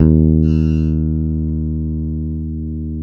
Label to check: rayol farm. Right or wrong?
Wrong